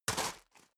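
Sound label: step boots gravel medium single left, footsteps; schritte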